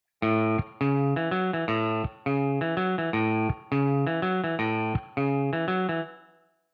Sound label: guitar pattern 2 gt